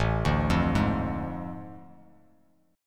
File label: A Major 7th Suspended 4th Sharp 5th, Bass Standard; AM7sus4#5; arpeggiated; JGuitar